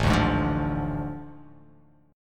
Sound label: A Major 7th Suspended 4th Sharp 5th